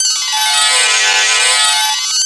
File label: ETH SITAR; AMG - Now CD-ROM (Roland)